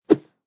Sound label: Sword swings in air; Combat Swings